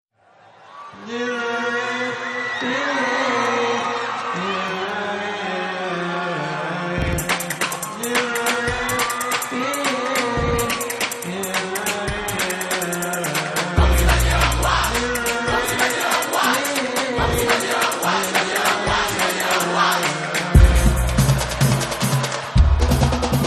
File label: axe trio eletrico; sampler axe